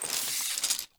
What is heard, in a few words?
Weapons, Bow, Grab Arrow, Drag, Pull, Bag Rustle; DeltaVR; FMOD; Objects Pickable